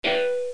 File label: noiz; Music Toys; star